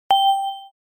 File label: Button positive notification